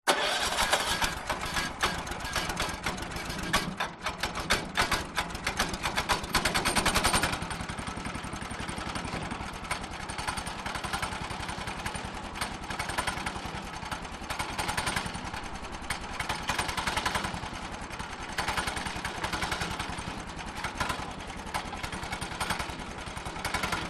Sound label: industrial woodchipper petrol motor engine start idle running slow